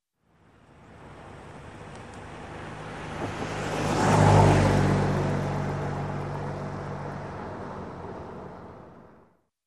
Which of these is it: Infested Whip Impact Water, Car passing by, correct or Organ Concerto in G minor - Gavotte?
Car passing by